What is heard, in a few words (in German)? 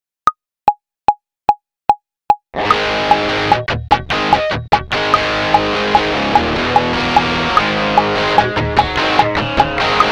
The Train ohne Drums